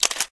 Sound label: camera snapshot; LG LS670 Optimus S